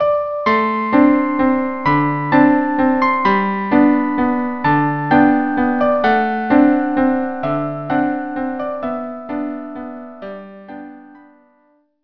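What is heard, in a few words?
violin viola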